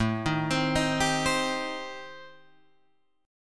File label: A-Minor 7th Flat 5th, Guitar Open G, chord